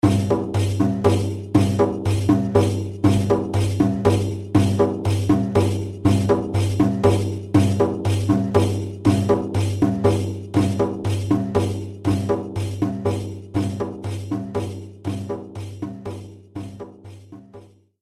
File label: TAMBURA